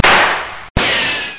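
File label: shot and bullet ricochet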